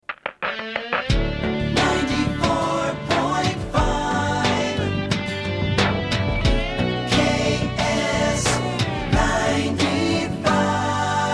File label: Jingle; KSTP FM